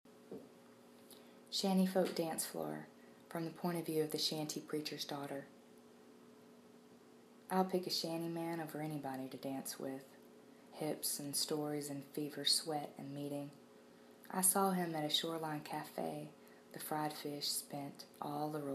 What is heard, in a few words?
Shanty folk Dance Floor